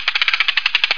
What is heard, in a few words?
BUZZSAW; JOHNNY